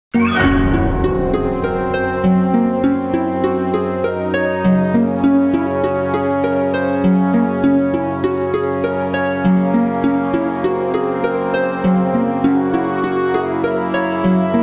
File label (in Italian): musica elettronica; electronic